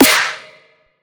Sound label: Titan Snare C, EDM; Drums; One Shots; Snares; Cymatics; EDM Starter Pack